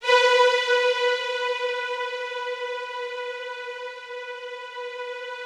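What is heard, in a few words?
rough strings B3 right, Yamaha VP-1; Music Store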